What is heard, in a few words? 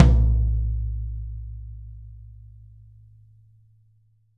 YAM18, drums; Big Fish Audio; Ross Garfield Drums VOL-2